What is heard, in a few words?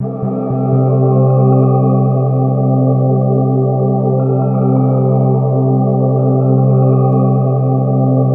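soundtrack, ethereal atmospheres; USB Soundscan vol.13; AKAI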